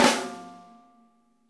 snare flam; Sonic Reality; Interactive Drum Kits; ID SNARE 2+

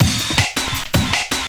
Drum & Bass, 160 BPM

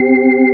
HAMMOND C3, organ; AKAI S-Series CD-ROM Sound Library VOL-2

